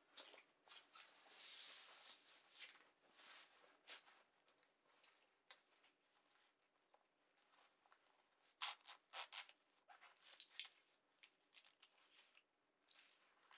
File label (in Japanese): ジーンズを穿く